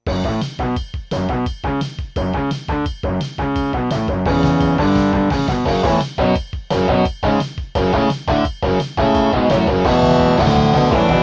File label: intro, gus classic; Soundcard test page; Gravis Ultrasound Classic